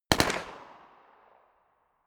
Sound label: smg uzi 45 distant burst